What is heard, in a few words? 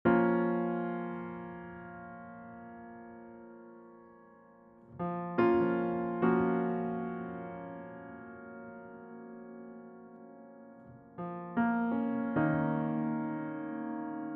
78bpm Piano Samples